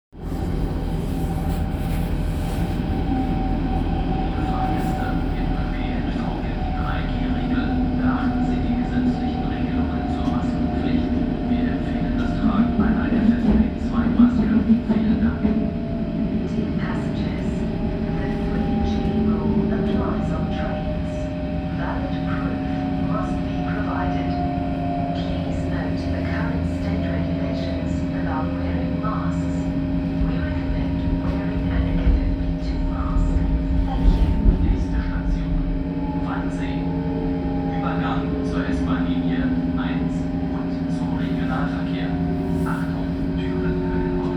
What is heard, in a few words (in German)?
S bahn